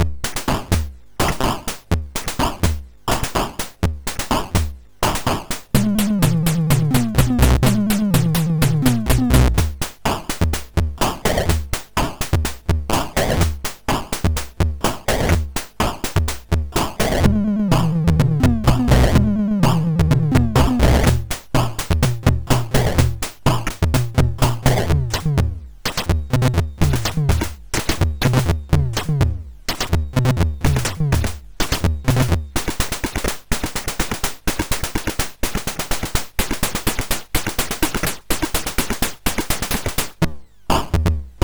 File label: Drum, MOS6581R3